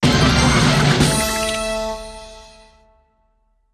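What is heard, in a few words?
Jingle Mini, success; Ape Quest; PSP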